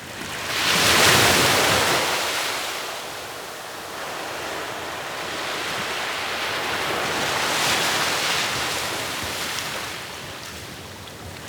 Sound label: Beach Waves Loop; Warframe; Grineer Beach